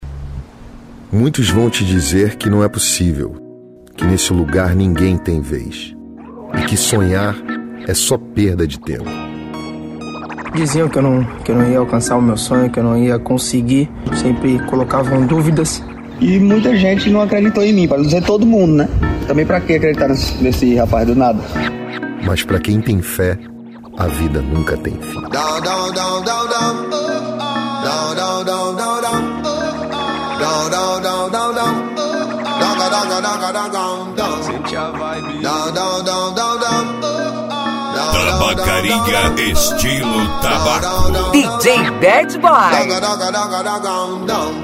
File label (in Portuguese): funk conciente e hip hop nacional